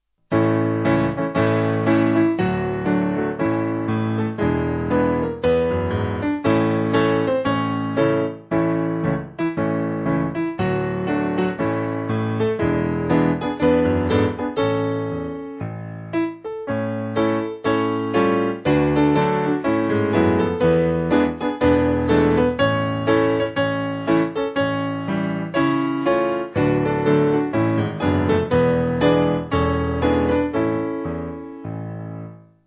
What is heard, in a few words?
Would You Be Free, Piano